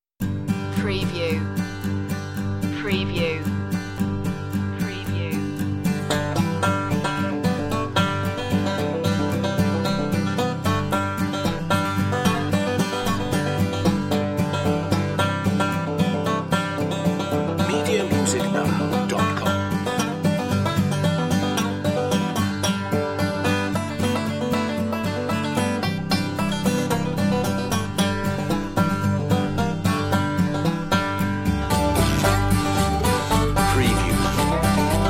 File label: farmyard blues